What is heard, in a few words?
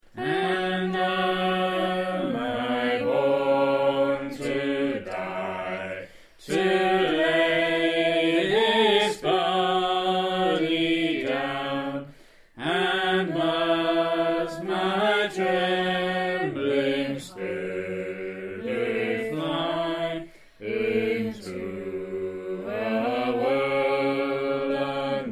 bass; idumea